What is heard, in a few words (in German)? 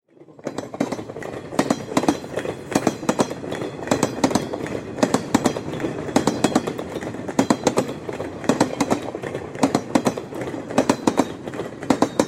Gueterzug Raederklacken kurz